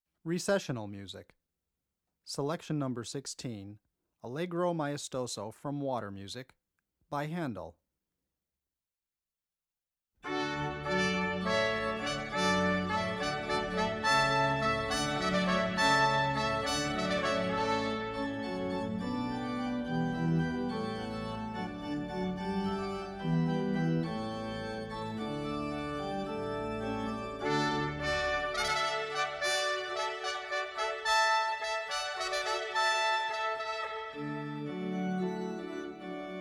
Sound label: Horn Pipe; from Water Music Handel; philipfeo